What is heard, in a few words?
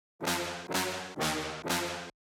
Brass Fill DRY 126BPM Fm